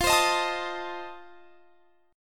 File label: F 7th F down; strummed